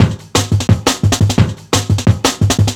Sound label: DNB BREAK